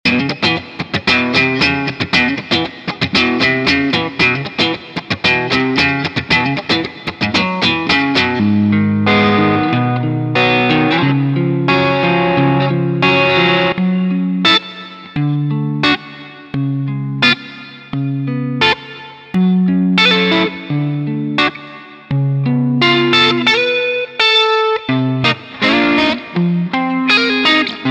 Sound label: Guitar; Guitar Magic 1